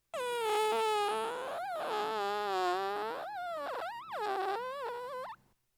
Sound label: fart